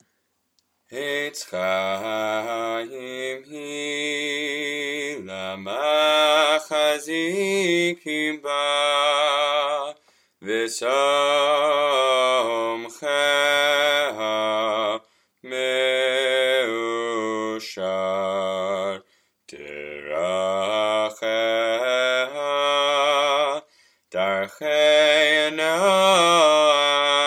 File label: Chazzanish; Musaf; Eitz Chayim